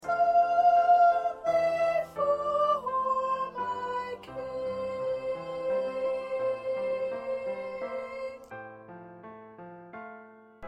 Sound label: 2nd Soprano